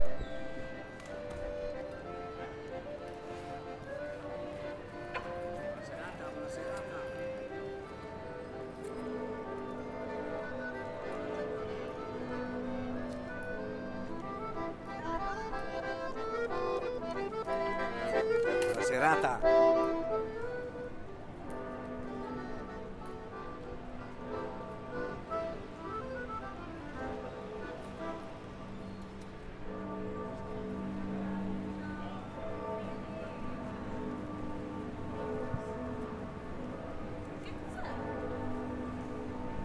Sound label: Florence Accordion, street musicians; Accordion and Duomo Bell